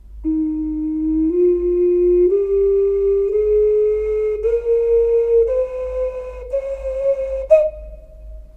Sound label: okarina